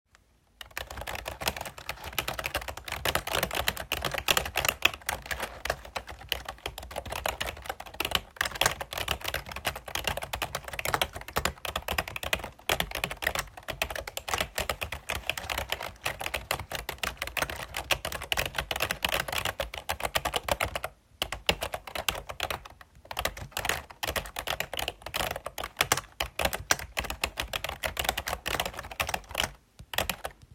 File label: Keyboard